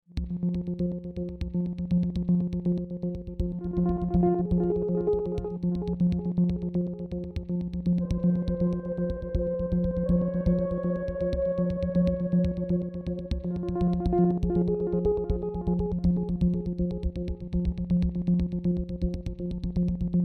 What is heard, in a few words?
Tense Bed Clicky